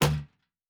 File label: Metal Box Impact; Sci-Fi Sounds